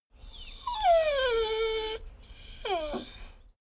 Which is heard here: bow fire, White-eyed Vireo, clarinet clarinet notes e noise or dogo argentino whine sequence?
dogo argentino whine sequence